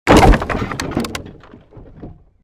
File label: created wooden barrier smash medium